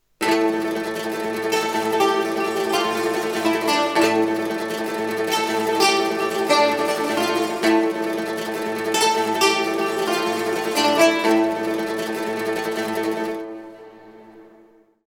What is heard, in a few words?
Fantasy Strings